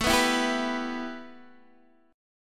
A 7th Suspended 2nd A, Guitar Open D; chord

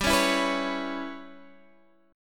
G 6th Flat 5th; G6b5 strummed; 15,16,14,16,14,x

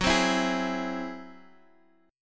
G sharp Augmented 7th F sharp

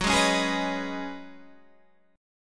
Db 7th Suspended 2nd Suspended 4th Gb

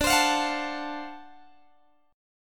D 7th Sharp 9th, Guitar Open G

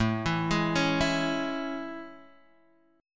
A Major 7th A

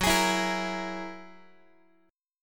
Gb 9th, Guitar; strummed; JGuitar

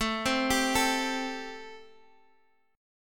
A-Minor 7th A Guitar; 17,15,17,14,x,x; arpeggiated; Am7

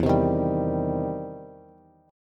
E Minor Major 7th Sharp 5th, E, down, Bass; EmM7#5 strummed

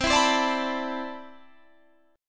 C Major 9th Add 13th, guitar chord

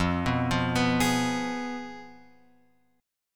F Diminished, guitar chord; Fdim Chord {1 2 3 4 X 4} arpeggiated

